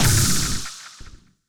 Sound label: magical attack